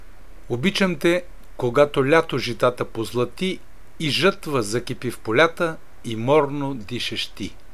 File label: kuplet